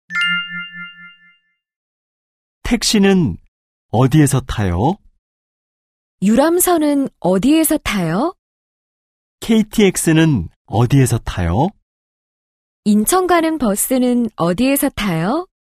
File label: K-POP